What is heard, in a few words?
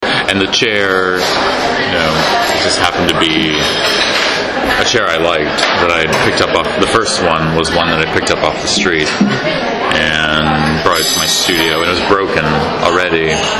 chair